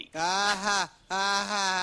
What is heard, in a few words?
Sarcastic Laugh; Woody